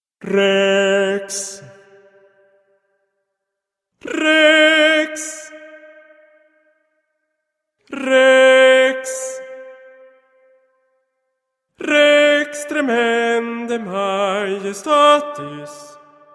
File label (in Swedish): bas; Rex tremendae